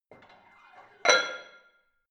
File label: barbell plate put on hit damped soft, fitnessstudio; sport freizeit